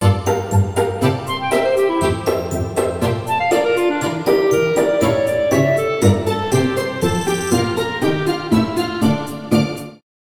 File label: Free Intro Music For YouTube; OurMusicBox